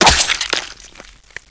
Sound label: knife hit, weapons